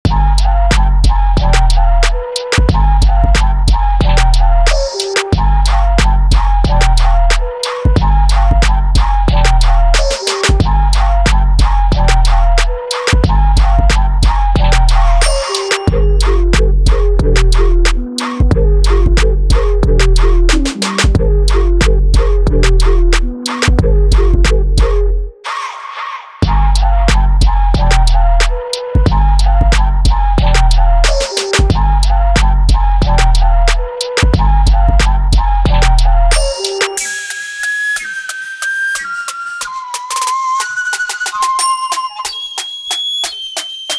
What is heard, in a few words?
Instrumental